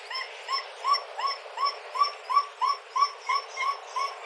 Cuon alpinus whistle; dhole; Calls of a dhole, separated from a pack

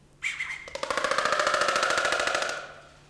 Ciconia boyciana click; Threatening display of beak clicks of an agitated adult male

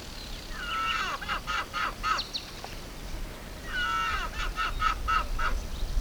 Procavia capensis rhythm

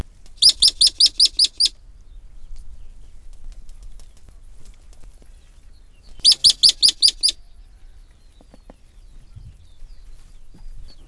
Spermophilus fulvus alarm; Yellow ground squirrel; The alarm calls

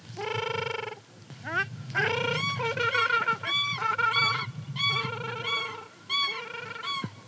Tadorna ferruginea flight; Calls of flying away pair, rhythmic male calls and prolonged female calls

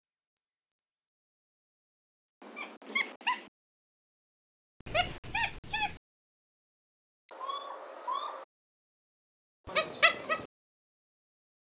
Cuon alpinus repertoire; Dhole vocal repertoire: squeak, yap-squeak, yap, whistle, bark, squeal, scream, whine, howl, grumble, staccato

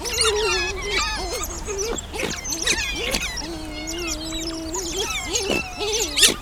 Aonyx cinerea group; The loud calls are producing in a group of the otters – the weaned youngs try to suck their mother, but she is rejecting them actively

